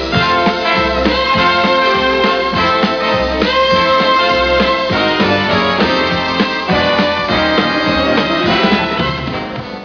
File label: jazz hot